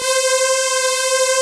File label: RESO GRILLE; Pro-Rec - Synthsonic